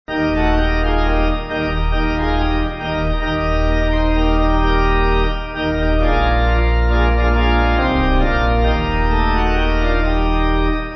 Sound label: Chorus Pipe